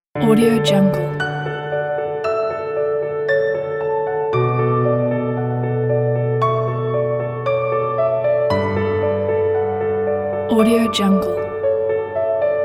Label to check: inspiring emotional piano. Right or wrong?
Right